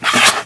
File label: ICEHORN damage